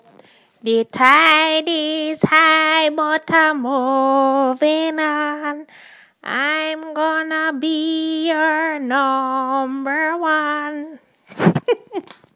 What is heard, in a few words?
Jamaican granny singing voice rocksteady